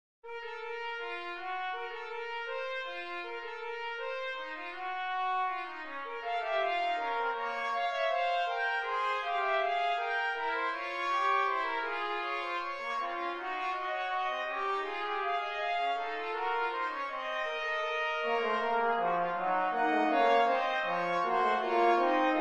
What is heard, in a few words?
Fugue Brass Choir; WTK Fugue 2